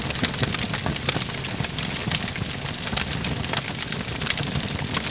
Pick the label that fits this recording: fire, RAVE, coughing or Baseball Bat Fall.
fire